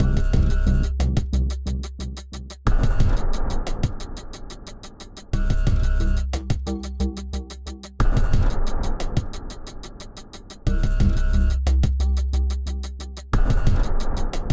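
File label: Music Stinger Lose Loop; jetpack fighter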